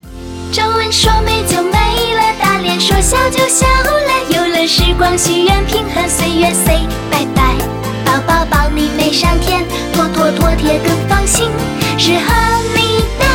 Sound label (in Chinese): 录唱